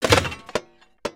Binoculars Smash; destructibles

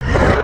Idle, creatures organic

